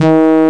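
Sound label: 707 brass ensemble; brass ensamble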